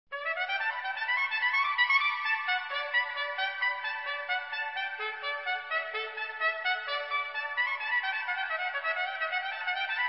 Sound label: Fanfare